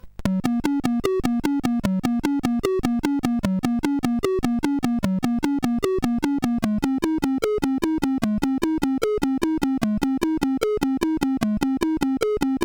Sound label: CSG8580; Square Scape 2